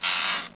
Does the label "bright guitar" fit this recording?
No